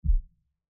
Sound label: hover off